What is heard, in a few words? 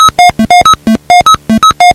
bleepy